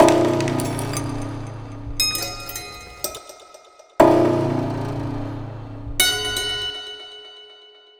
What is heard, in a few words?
Prepared Piano Loop; Sonic Foundry; Sony Creative Software; The Electronic Music Manuscript Collection